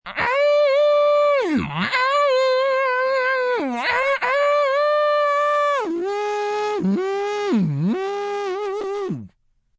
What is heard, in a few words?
Electric Guitar, Vocal instruments, rock guitar (human voice); Singing